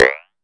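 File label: rim processed, rims, fresh disco house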